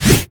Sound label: deadringer bell strike prep; Crypt of the NecroDancer